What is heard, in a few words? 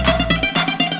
lover beat